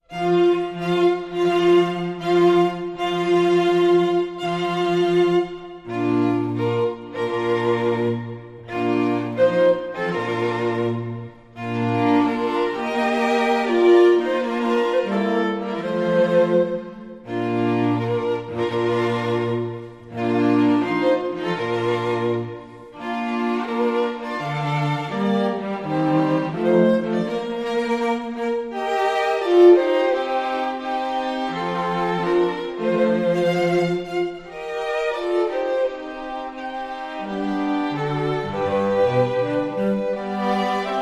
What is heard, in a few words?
Bridal Chorus (string quartet)